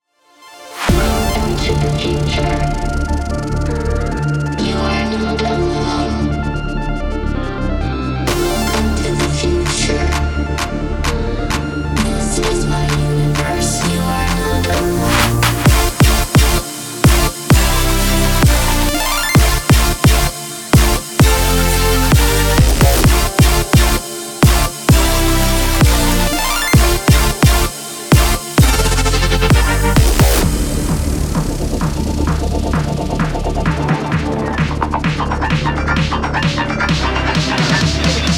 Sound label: House; Black Octopus Sound; House Starter Deck